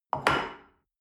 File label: shell upside down place on table hard; soundpool